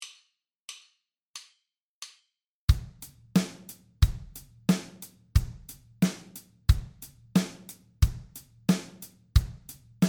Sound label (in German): Rhythmus Tempo 90